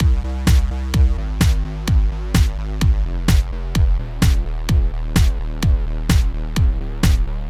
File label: sidechain only bass